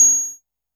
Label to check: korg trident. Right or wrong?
Right